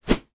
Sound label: sport tennis racket fast movement swoosh; sound effects one